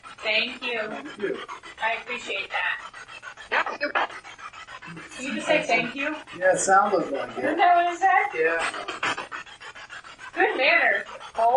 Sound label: Basement Spirit Box; gill house investigation